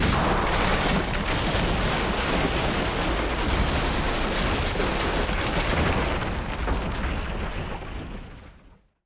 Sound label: Sound Of Destruction; LOOPERS